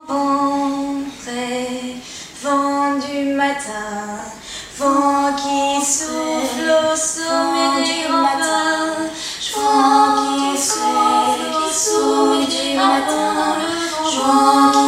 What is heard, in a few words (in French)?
canon exemple